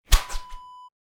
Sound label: whip whoosh